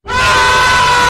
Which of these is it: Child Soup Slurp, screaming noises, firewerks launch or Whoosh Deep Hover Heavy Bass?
screaming noises